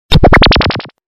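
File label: alien SMS, SMS for aliens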